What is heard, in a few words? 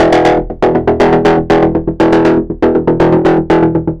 120 MOD GUIT E, Modular Synth Loops; Polyend; Simulacrum Sounds